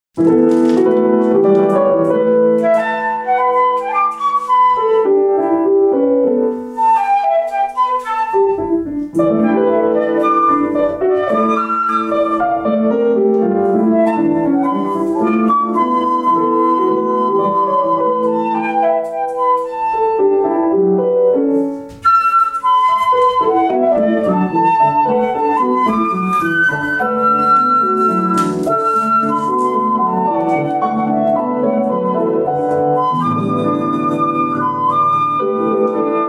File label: Echo; Hindemith